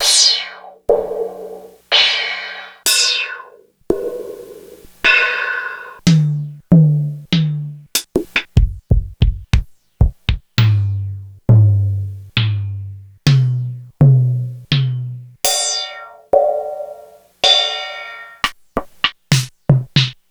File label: Korg DSS-1